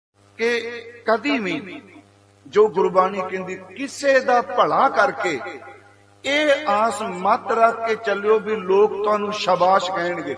new punjabi shabad; dharmik; waheguru ji

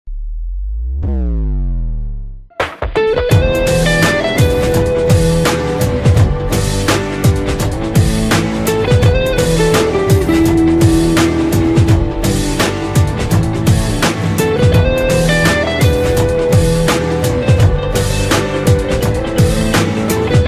love ringtones mass bgm

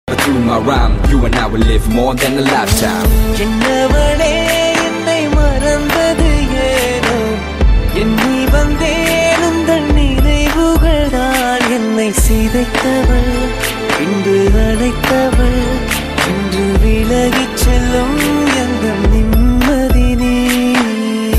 tamil melting love feeling

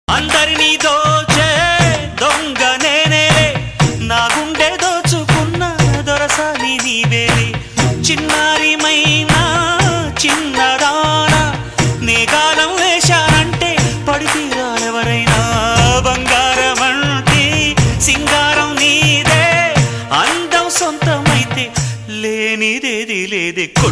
telugu love songs; gentleman; lovefailure

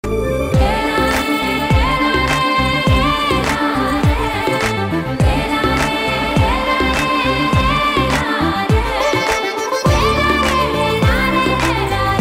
telangana folk bgm; fidaa 2017

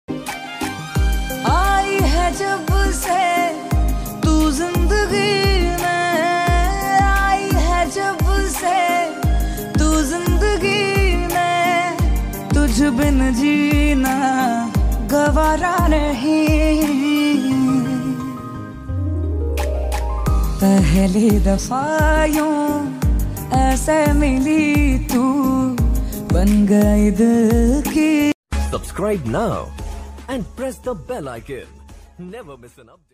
hindi song ringtone

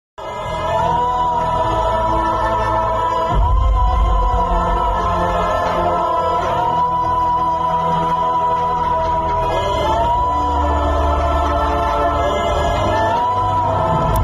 movie sad bgm; bichagadu2

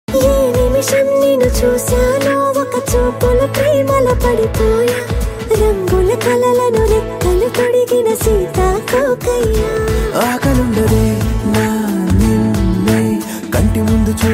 telugu love songs

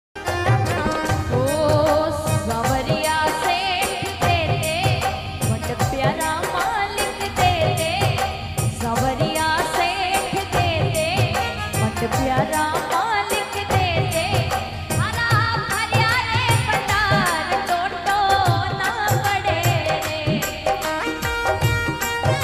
ringtone; savariya seth

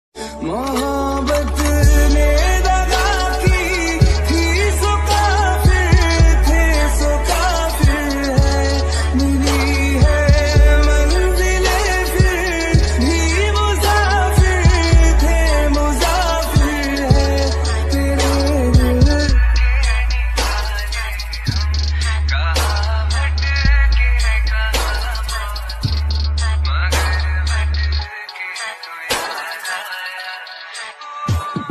sad ringtone hindi song ringtone

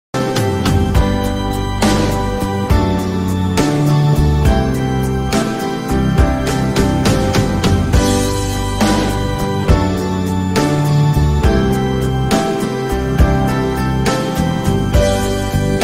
ringtone instrumental

